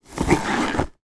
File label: foley boots wellington pick up pair; sound effects one